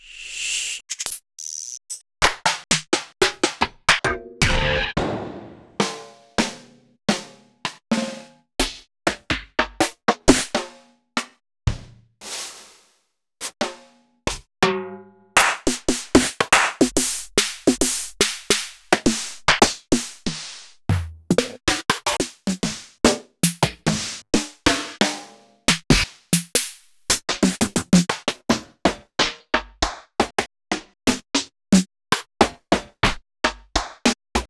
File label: Roland MC-9095